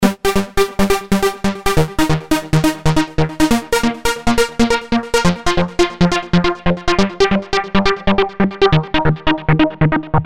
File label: arp